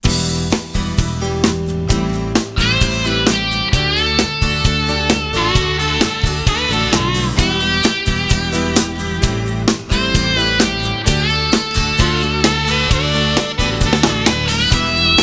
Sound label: rock soft, rock band; Musical Instruments